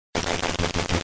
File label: Glitch